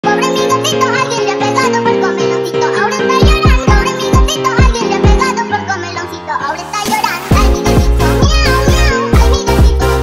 Phonk Montagem

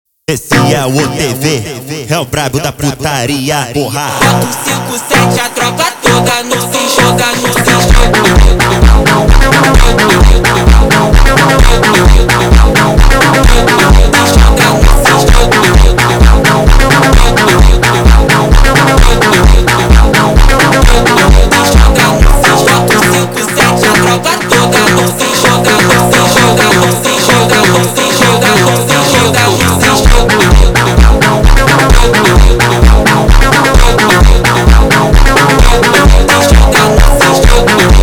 Phonk

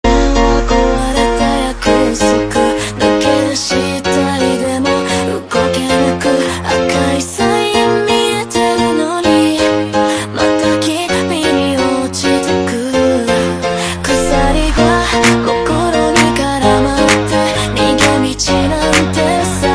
Phonk

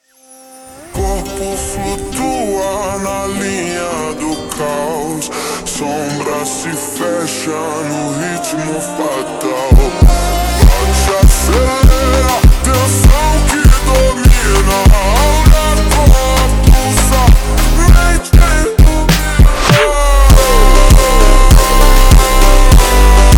Phonk, Super Slowed

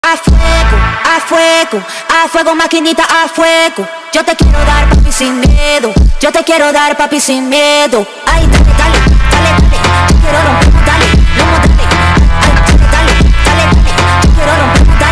Phonk

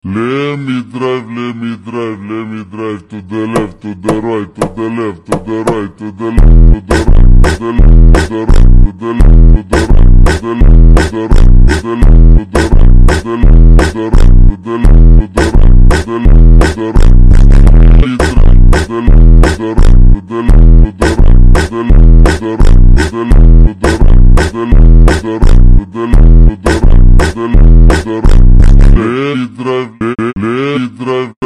Phonk, Ultra Slowed

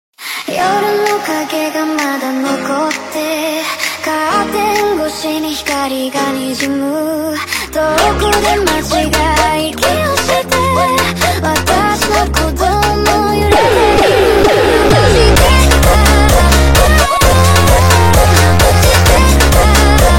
Phonk MONTAGEM JUMPSTYLE

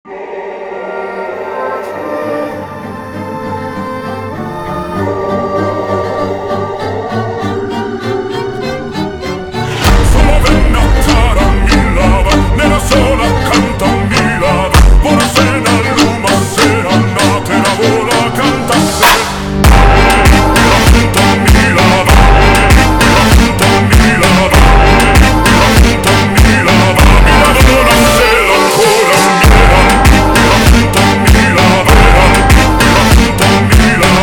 Phonk, Slowed

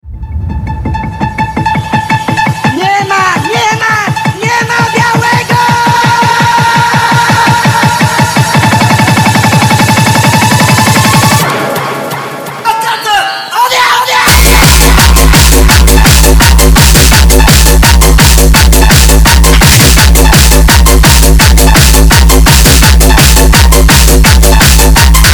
Phonk Sped Up

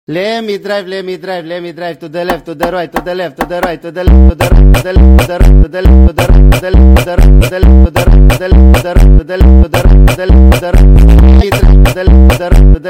Phonk

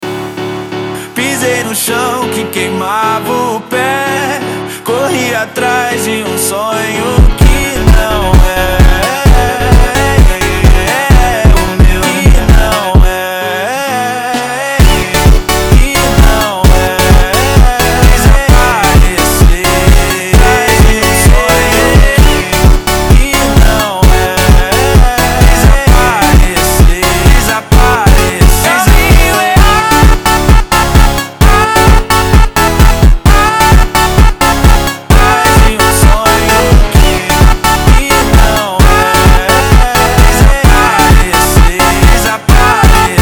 Phonk

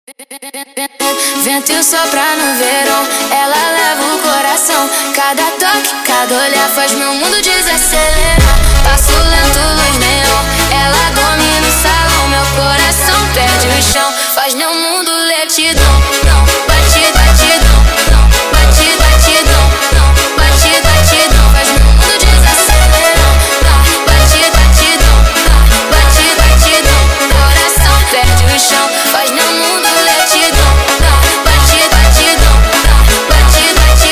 Phonk

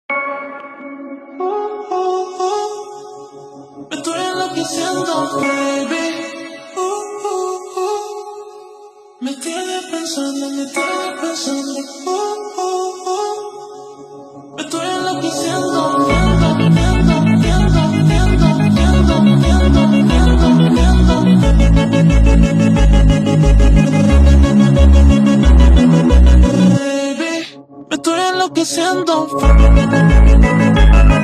Phonk

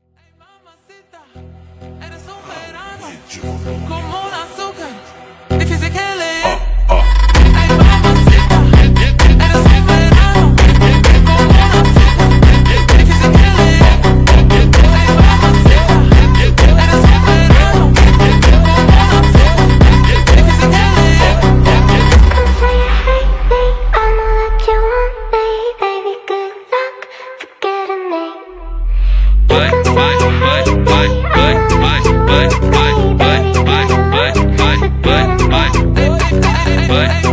Phonk

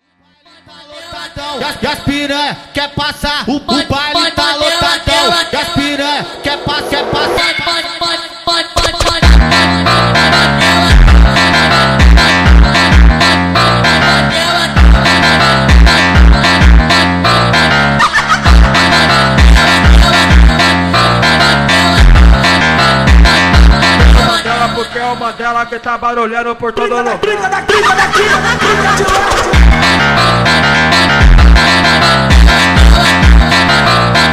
Phonk

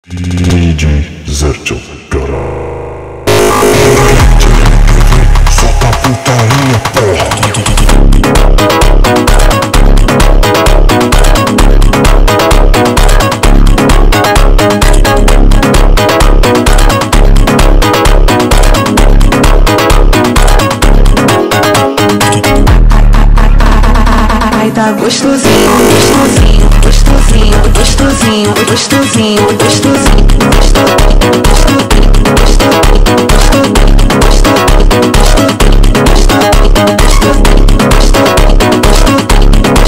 Phonk MONTAGEM

